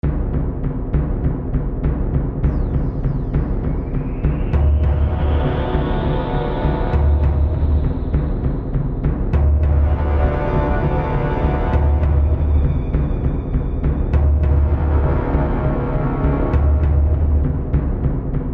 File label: Epic Battle Theme; Neighbouring Islands